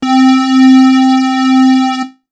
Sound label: E-Mu Proteus 2 Sync Lead C6